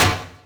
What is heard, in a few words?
block success